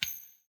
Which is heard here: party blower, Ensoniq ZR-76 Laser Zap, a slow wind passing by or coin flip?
coin flip